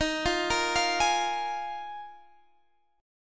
Eb Suspended 2nd Suspended 4th, Guitar Half Step Down